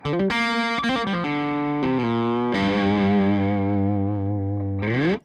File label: Guitar Riff